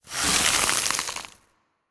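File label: Ice Death swoosh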